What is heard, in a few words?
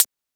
Closed Hat; FLPRO